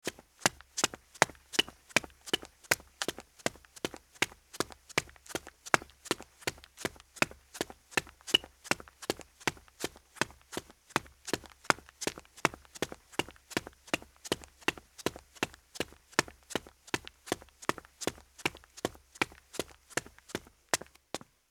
Footsteps Sneaker Concrete Run

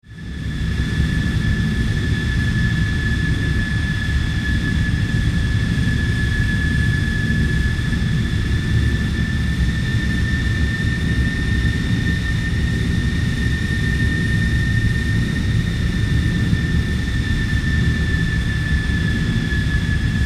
Airplane Jet Fighter In Flight

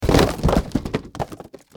Crash Cardboard

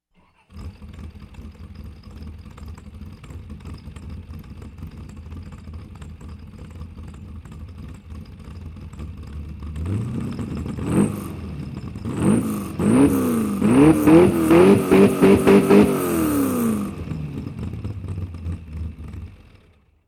High Performance Car Start, Idle, Rev, And Stop

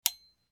Switch

